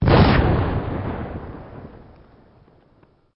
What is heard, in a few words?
Explosion; CastleMiner Z; Xbox 360